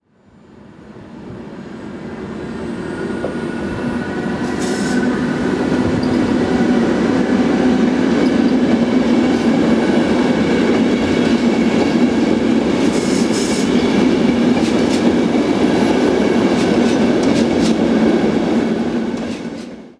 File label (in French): trains diesel et electriques; sonotheque